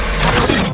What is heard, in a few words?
Gun Holster Scifi, Weapons Foley; SFX Kit